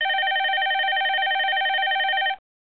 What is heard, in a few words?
ring